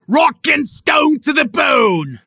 Bar Cheering when drinking

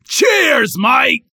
Bar Cheering When Somebody Orders Drinks

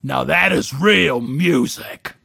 Jukebox

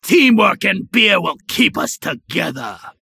Bar Cheering when drinking

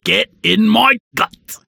Bar Cheering When Somebody Orders Drinks

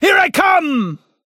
Grinding Pipes

